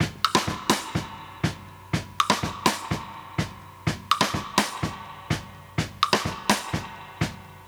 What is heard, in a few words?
125 Sprung Breaks; Loops; Touch Loops; ClassicBreakSessions